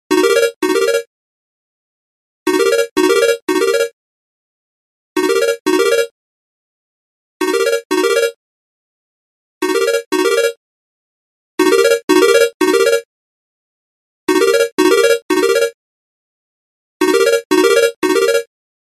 nokia classic

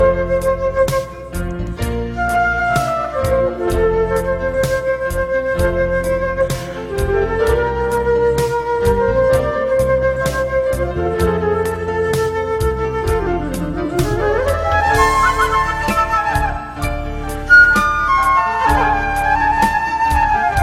wildflower flute; Electronica

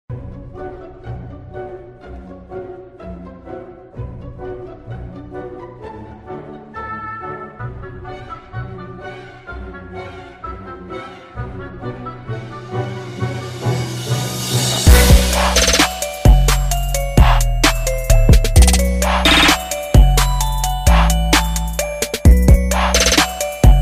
trap remix

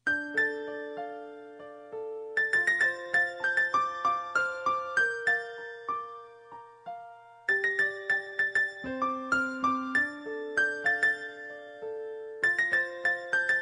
piano cover

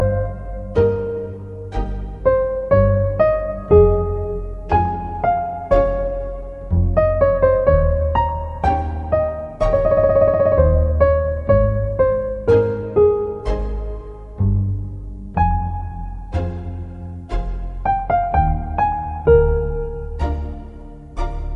slow classical music

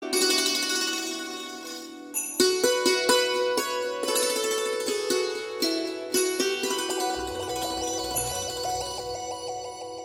classic music

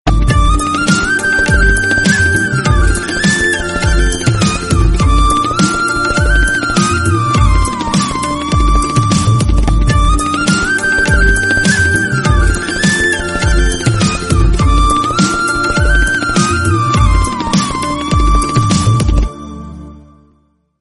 love flute; Yaan

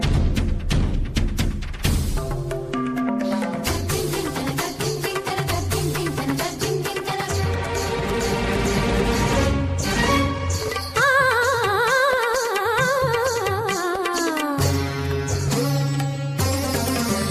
music and dance

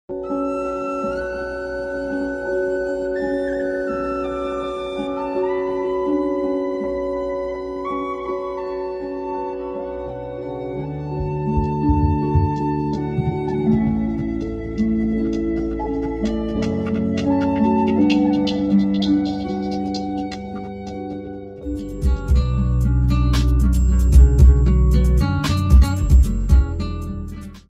magical flute

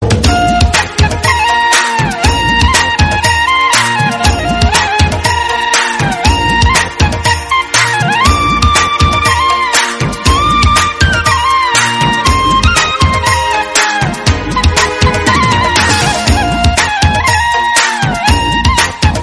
flute music; iPhone

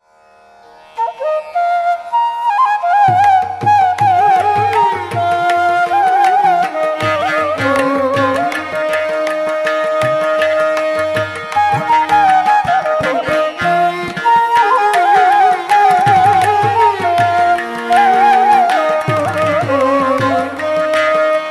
flute; Alaiypayude

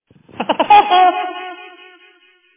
new whatsapp ring tone; Funny

